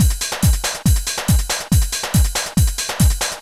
techno 140

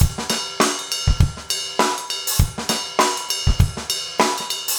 basic funk

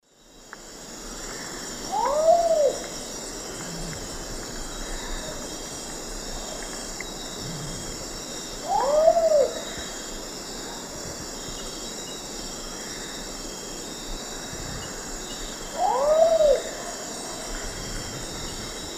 Nyctibius aethereus, songs 2 birds; S. America; Eastern Lowlands

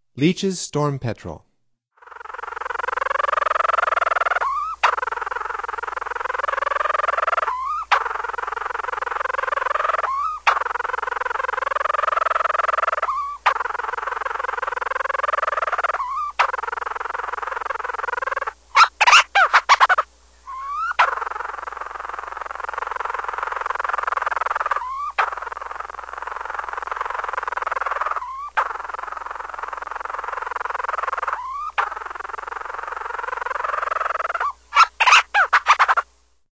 Leachs Storm Petrel; North America; Stokes

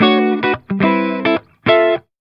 Guitar B min9 B min7 A min9 A min7 D min9, Jazz

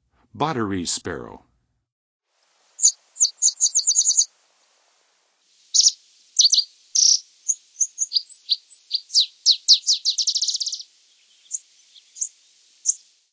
Botteris Sparrow, Songs of Birds of North America; Western USA; Western USA Songbirds

